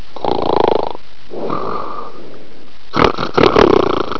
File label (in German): SCHNARCH